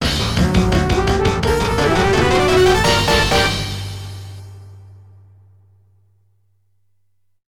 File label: GAME OVER (MT-32＋Internal Ver.); Phalanx